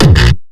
bass kick

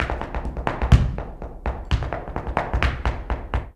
Flamenco, ZAPATEADOSOL

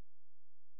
A#, FX; Big Fish Audio

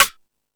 Percussion FX, E-MU Pro-Cussion

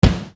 Bass Dr, EuroDance Bdrums

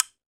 guiro; NorthStar - Global Instruments VOL-2; Guiros

